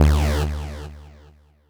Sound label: Synth Hit D